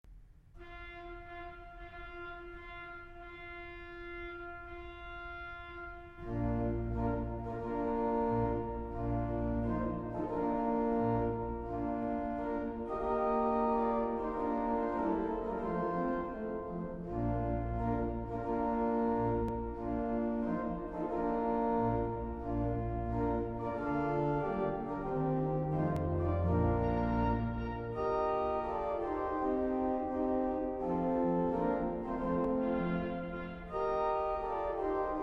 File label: bridal chorus; Wedding march; richard wagner